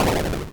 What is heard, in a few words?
Boom